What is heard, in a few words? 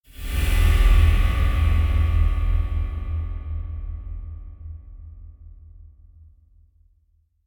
Metallic Impact Occult Finale; Hollywood Edge; sound designer's toolkit v2